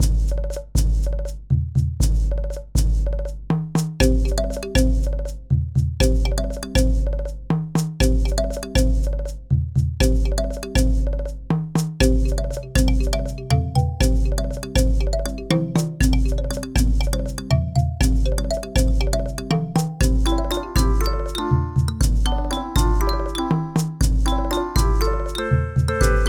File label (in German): Musik